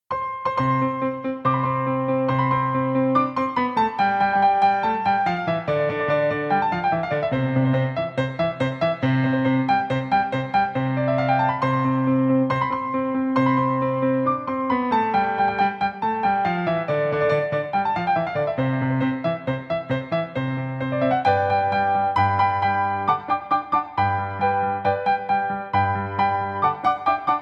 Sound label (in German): Chor der Janitscharen Karaoke Version